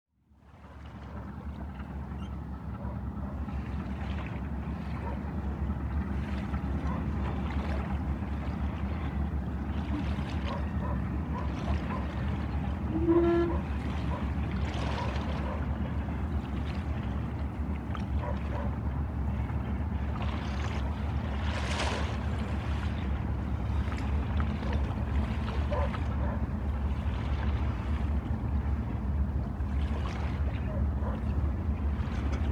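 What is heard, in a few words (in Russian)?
На барже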